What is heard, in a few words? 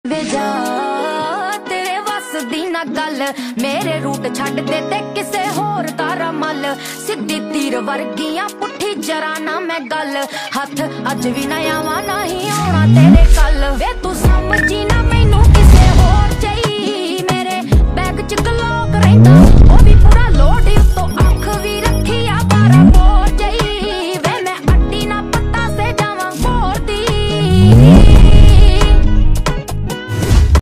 Song Ringtone